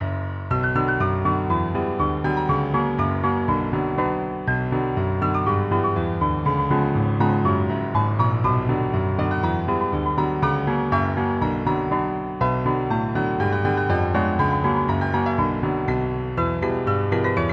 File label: The Piano